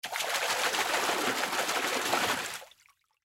Fish Splash Water, Fish Splash In Water Fast Movement; Water Splash Puddle Multiple Small; Animal; Splashes; Objects; Blastwave